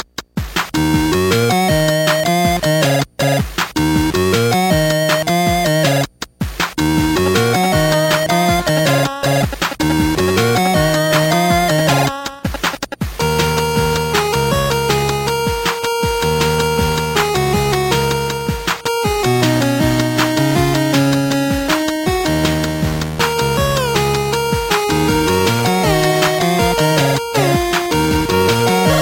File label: game boy